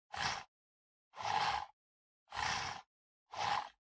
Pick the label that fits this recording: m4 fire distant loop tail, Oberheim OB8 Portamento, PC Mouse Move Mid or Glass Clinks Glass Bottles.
PC Mouse Move Mid